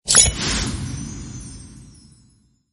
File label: Speed Fruit Gain